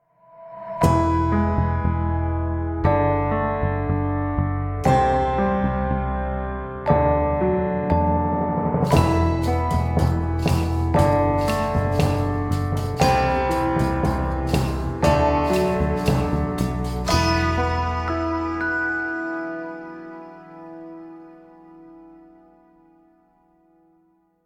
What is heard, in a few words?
gameplay stinger good; curious expedition 2 macos ps4 switch windows xbox one xbox series xs gamerip 2021